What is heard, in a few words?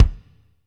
BD, BD REAL